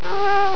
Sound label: CAT